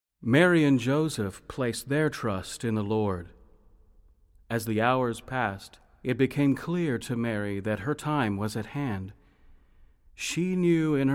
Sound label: satb